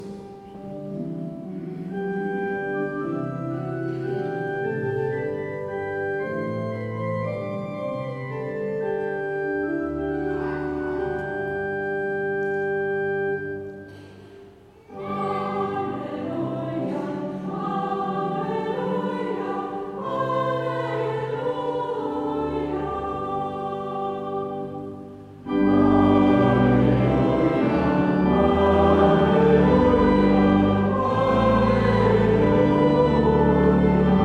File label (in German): Halleluja, Chor